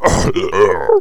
death; player

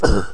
fall; player

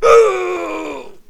death; assassin

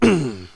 fall; assassin

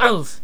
fall; player

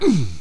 fall; player

